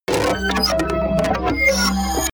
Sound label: squeaking straw; sciene-fiction